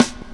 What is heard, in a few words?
sharp snare single hit b key; Duration 0:01; Channels Mono